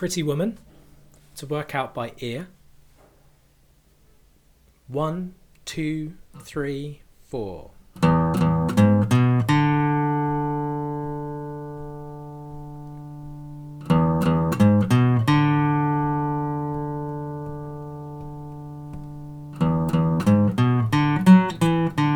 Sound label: Intro Riff